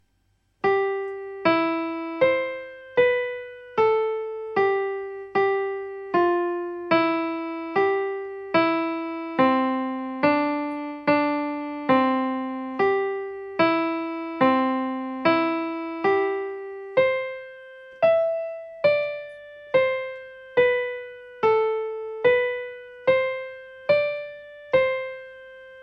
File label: piano; Le printemps